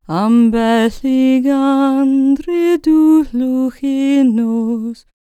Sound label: CELTIC, Vocal Planet World Voices